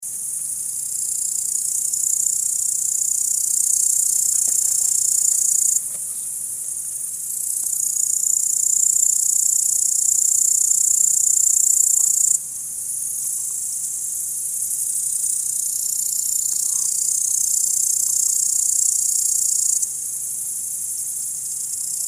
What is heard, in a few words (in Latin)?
Pholidoptera littoralis; BIOAC ORTH